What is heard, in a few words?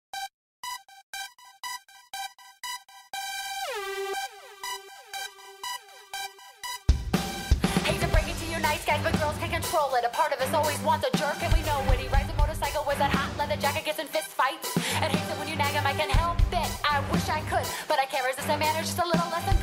Bad boy rap